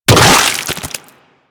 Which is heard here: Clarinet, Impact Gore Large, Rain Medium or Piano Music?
Impact Gore Large